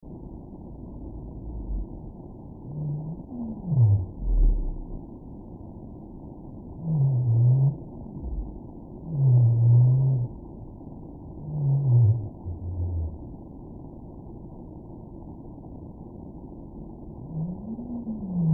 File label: whales